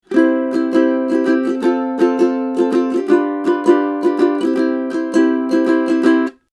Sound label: Neumann M49c cardioid Ukulele 50cm 15th Fret